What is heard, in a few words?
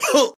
Scream